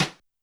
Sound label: Brush Slap; YAMAHA RM1X